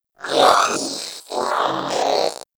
Zanuka Companion Vocal Breath Walk; Pets; Warframe

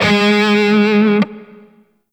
Grineer Shawzin Flourish Oct Two G Sharp; Warframe

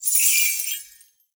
Naginata Whoosh Tonal, Weapons Melee; Warframe; Koumei Naginata

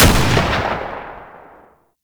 Archer Shot; Warframe; Tau; Tau Dax; Weapons; Tau Dax Archer Gun; Sybaris

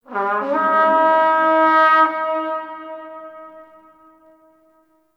Battle Trumpet Horn Call; Warframe; Tau

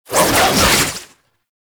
Grapple Travel; Boss; Enemies; Abilities; Warframe; Oraxia

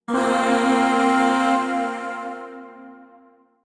Void Shawzin Hirajoshi Chord; Warframe; Zariman; Chords

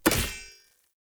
Short Sword Throw Medium Impact Wood Hollow Large

